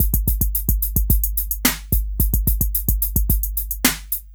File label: Smooth, R&B Drums